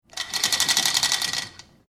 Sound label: Chain Through Pulley Medium Speed; Chain Sounds; Metal Sound Effects